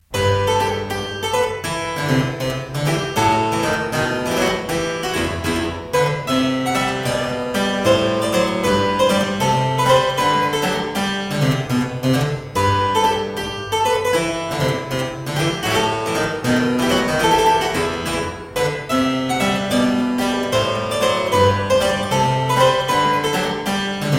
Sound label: Suite no 12 in F Minor - Gigue, spoken; Johann Mattheson; The Twelve Suites of 1714